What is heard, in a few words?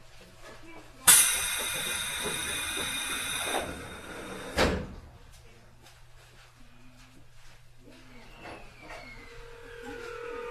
tokyu 7700, train